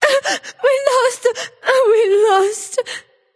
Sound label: boo hoo; daji